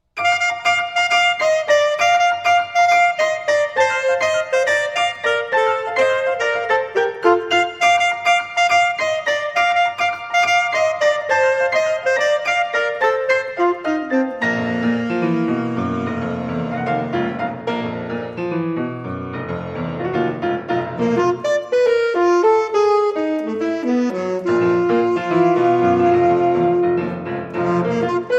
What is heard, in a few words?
Flight of Fancy for alto sax and piano